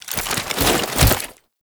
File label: Prone To Stand; Lancer; Warframe; Lasrian Soldiers; Foley; Stance